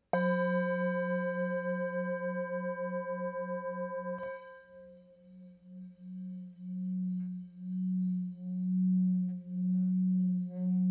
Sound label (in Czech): tibetska misa